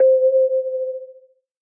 KEYS Metallic - C, Synth One Shots

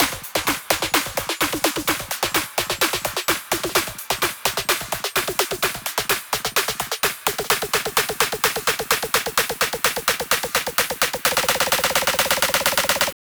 Drum Buildup, 128 BPM; Drums; Loops; House; Cymatics; House Starter Pack

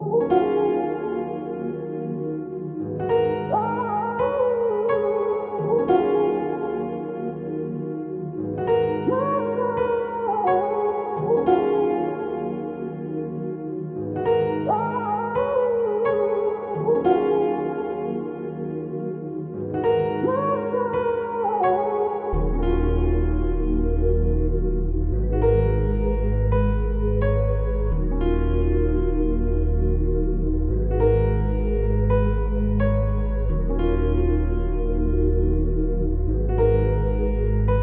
86 BPM D# Min; Cymatics; Zodiac III Beta Pack; Zodiac III Production Suite

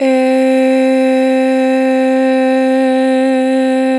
Long Eh - 2 bar vibrato, Vocals, Natural Vibrato; Harmor Vocals

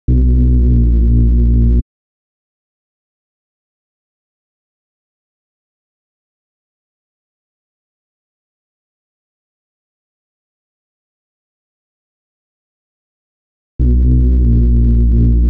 140 BPM D# Min Bass, UK Garage; Melodies; Loop Stems; Cymatics; GHOST (Beta Pack); Speaker

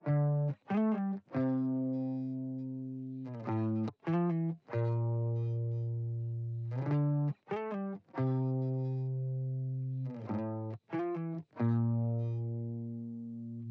140 BPM D Min Chords, Guitar; Grapefruit

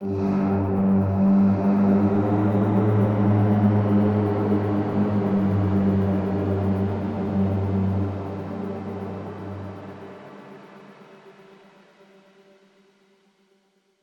War Ambience - G; House; FX

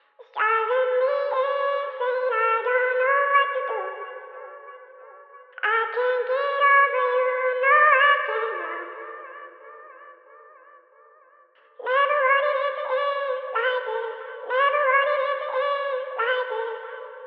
Vocal Loop 182 BPM C Min; Lovers; Cymatics; Mystery Pack Vol 4; Vocal Loops

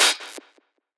ride oneshot, Cymbals; GhostHack

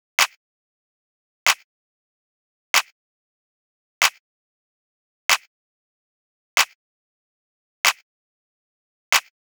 Drum Loop 94 BPM Clap, Hip Hop; Cymatics; Los Perro

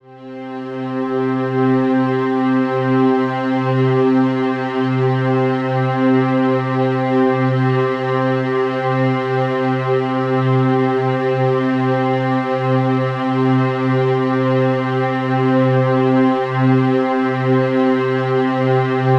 Atmosphere C

